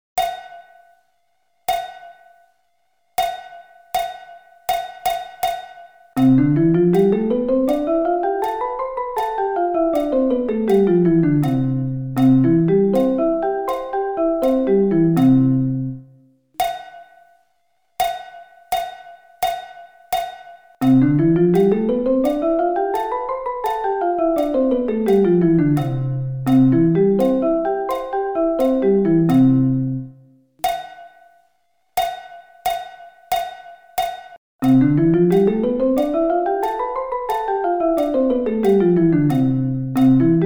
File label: C Scale